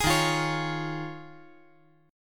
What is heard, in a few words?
D sharp 7th Suspended 2nd Suspended 4th